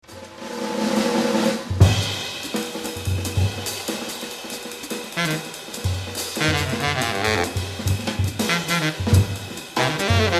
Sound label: BLUES IN F